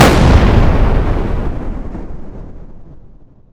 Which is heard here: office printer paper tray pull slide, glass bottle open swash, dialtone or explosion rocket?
explosion rocket